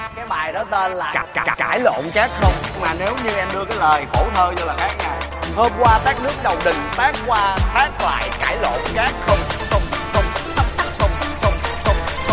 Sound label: remix